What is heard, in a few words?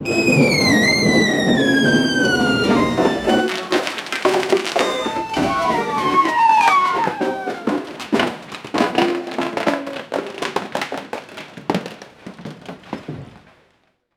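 marching band falling down; accident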